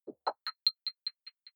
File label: button echo distorted high